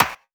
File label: Claps & Snares